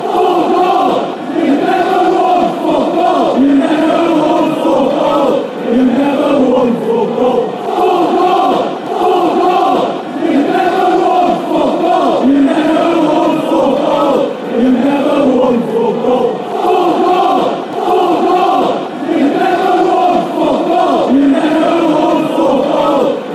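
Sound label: youve never won anything, football chant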